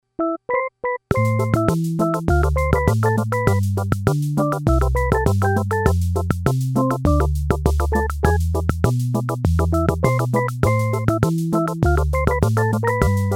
Casiotone 701

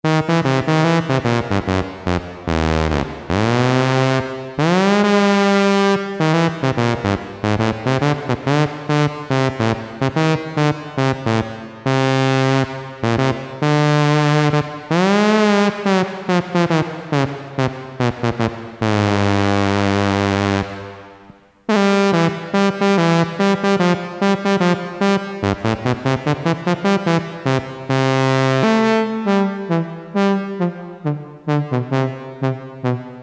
Trombone, Farfisa Syntorchestra Mono

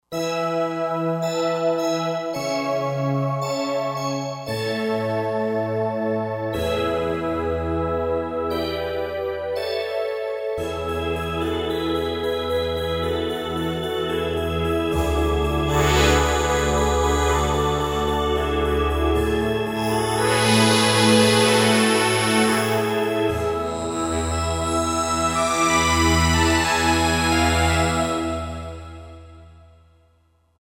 Roland JD-990, Glory

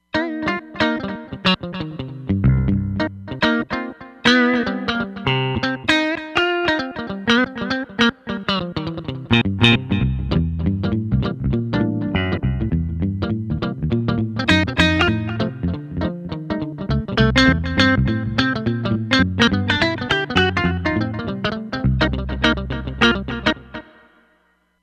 Funky Country

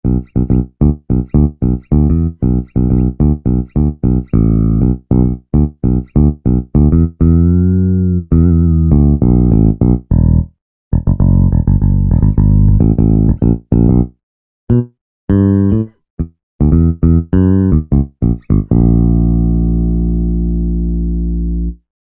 Fingerstyle Electric Bass; Apple GarageBand 2

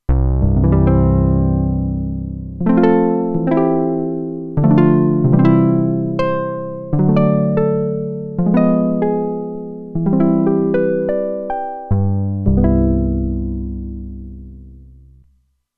Syn Harp, Roland Alpha Juno-2; Factory 2

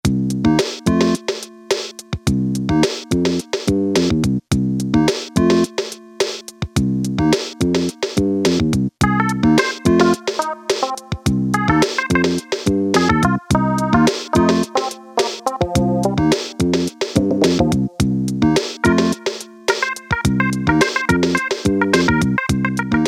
HIP-HOP 1, Casio Rapman rap patterns

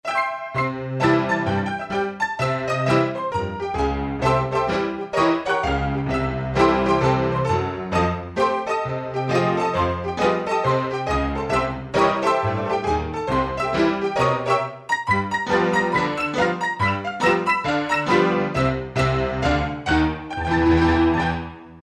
Player Pno, Alesis QS8; Factory Preset examples

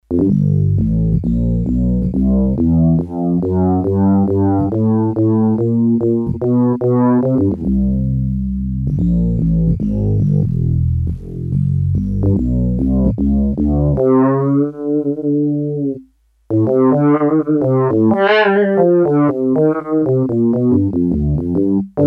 Round Bass, Korg DS-8; Factory Patches

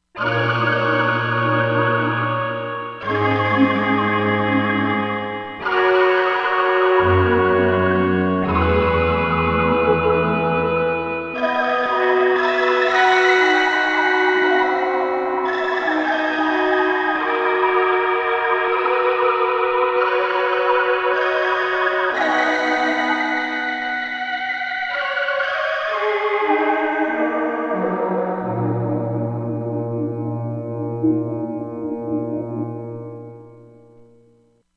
Wierd Choir, Korg MSC-16S; Environment

